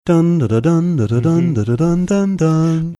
singing contemplative version football anthem

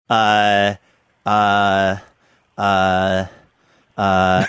uh huh huh huh

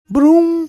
mimicking amazon echo ack sound

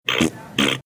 mimicking fart sound

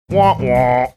imitating uh oh drop

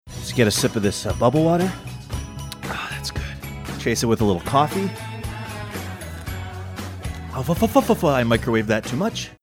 sipping bubble water chasing it hot coffee

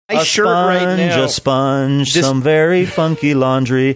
singing a sponge a sponge

